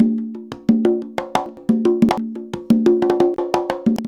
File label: CONGA 119; USB Soundscan vol.56 - Modern Percussion Loops; AKAI